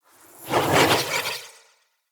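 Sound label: Staff Caster Projectile Whizz By Heavy; Weapons; Player; Staves; Starter Staff Caster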